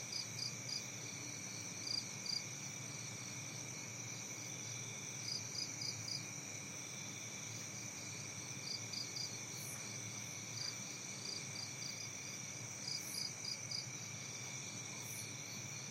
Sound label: forest night